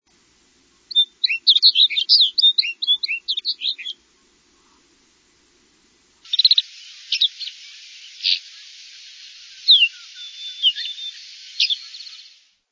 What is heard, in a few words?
Snow Bunting